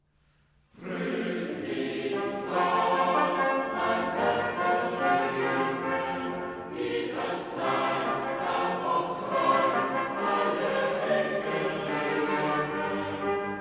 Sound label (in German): Chor